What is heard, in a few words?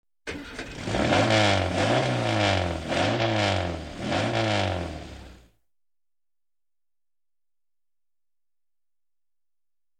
Muscle Car Engine Start and Revving